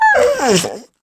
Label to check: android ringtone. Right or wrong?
Wrong